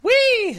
soda wee

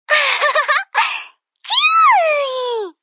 squee; lyria

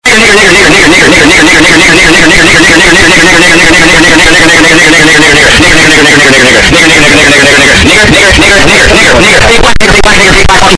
rapid fire

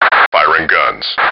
firing guns

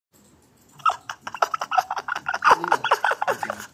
laugh

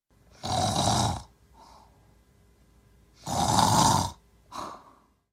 snoring

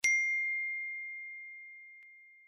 iphone plim

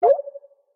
skype for linux sent message

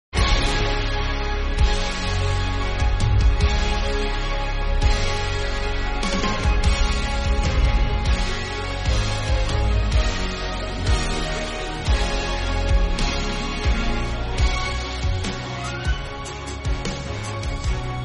instrumental

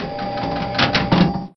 pinball sound

